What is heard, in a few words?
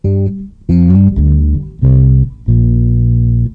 Fret bass lick; MELODIC SAMPLES